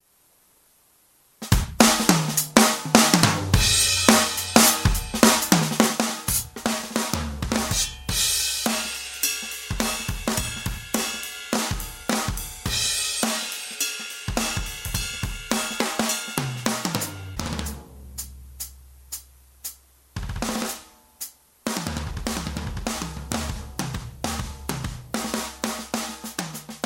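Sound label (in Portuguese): bateria grooves; kyynm batera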